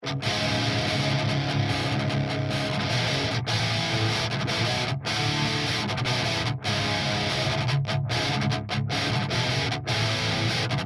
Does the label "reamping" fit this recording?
Yes